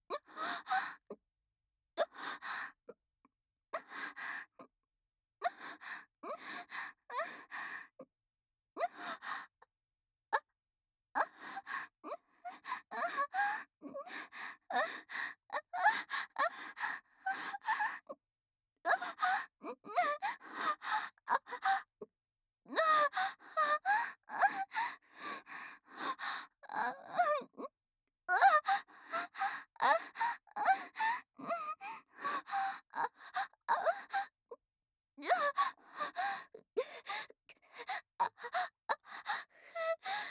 娇喘声 普通

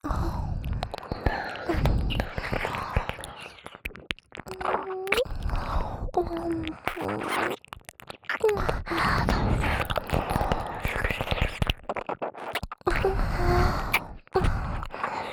兩耳親吻舔拭; 自慰輔助用特典音聲; 靠近耳邊

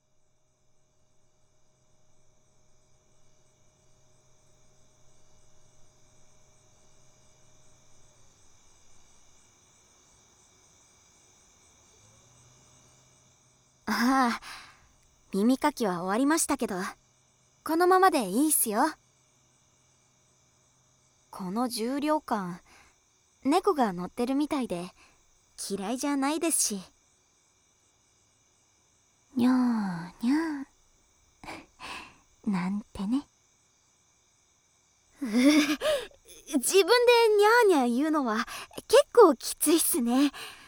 吹耳朵、左耳、痒痒的, ASMR

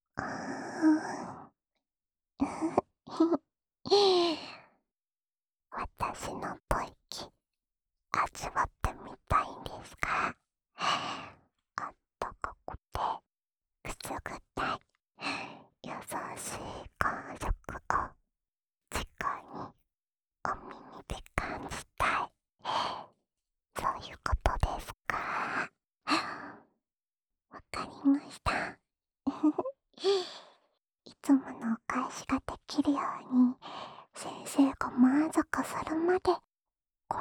安眠用 吹耳朵循环（左耳）

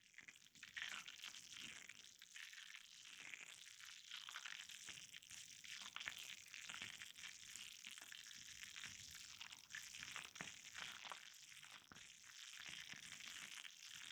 浴室中的NTR舔肛乳交; ASMRver

